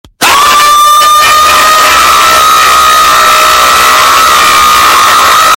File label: Scream earrape